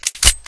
g18 slide back

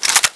m82 slide

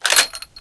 svd clip out

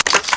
spass insert shell, weapons spass12

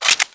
m82 clip out

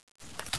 take mag, weapons ACR

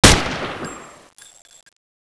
m4 firing, M4A1 Carbine

